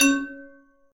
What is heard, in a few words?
plus; rollingballs